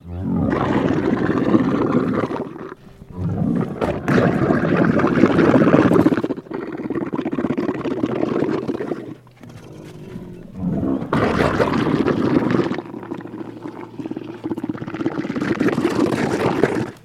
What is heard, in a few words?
Camel Wet Gargle; camels